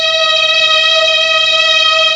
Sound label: MEL STR E4